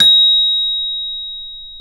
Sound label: PRC CEL A#5